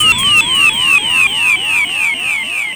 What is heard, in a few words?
Place Laser